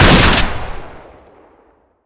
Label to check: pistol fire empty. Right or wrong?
Right